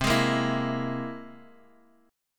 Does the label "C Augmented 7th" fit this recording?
Yes